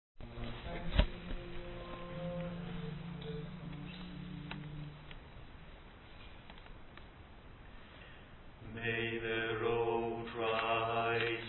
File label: Bass